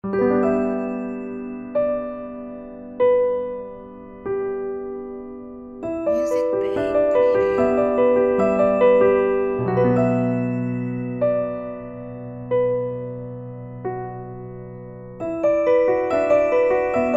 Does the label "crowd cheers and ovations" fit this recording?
No